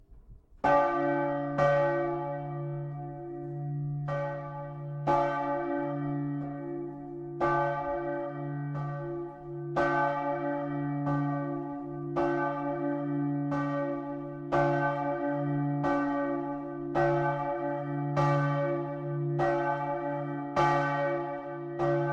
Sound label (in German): Glocken St. Petri Kirche Westerstede